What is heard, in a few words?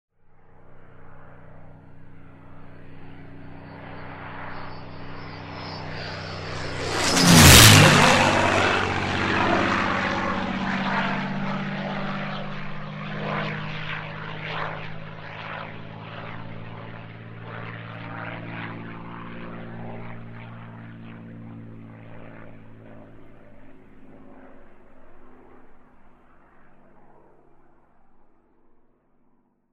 P51 Low Altitude Flyby